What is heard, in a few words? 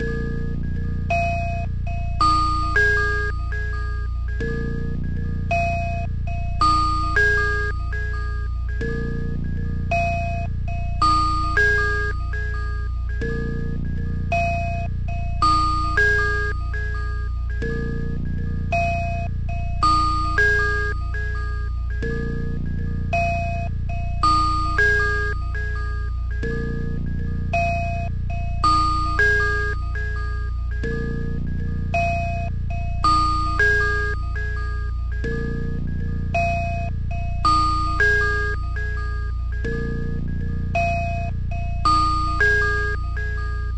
echo